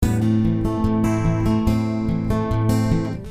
Picking Am 74

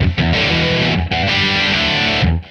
E4 95 chords

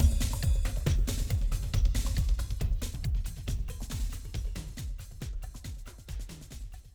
Clubbin' 138

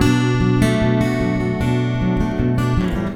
Picking G

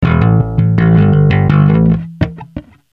Bass A,G,A 083

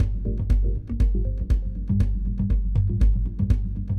Mallimban Gm7 120

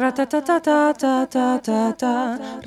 Vocal Echoes

